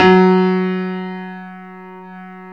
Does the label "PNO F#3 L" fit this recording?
Yes